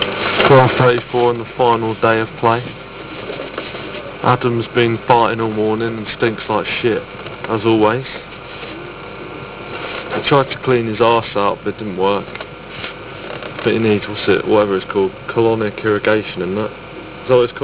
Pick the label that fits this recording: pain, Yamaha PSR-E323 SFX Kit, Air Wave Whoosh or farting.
farting